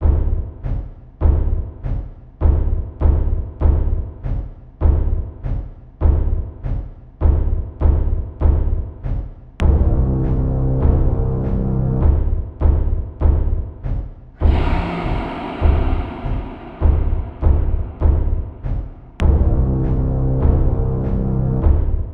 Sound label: Dungeon; Mordstreich